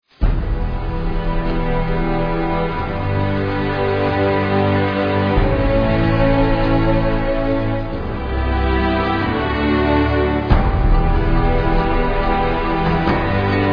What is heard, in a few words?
bgmusic